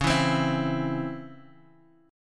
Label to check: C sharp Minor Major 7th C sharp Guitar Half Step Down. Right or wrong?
Right